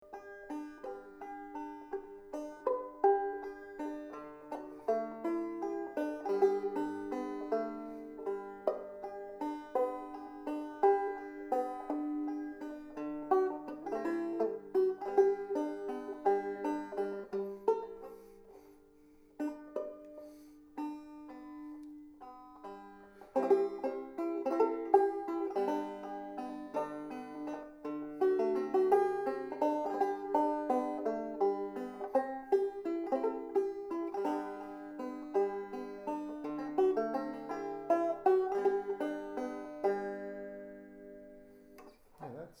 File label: Spanish Fandango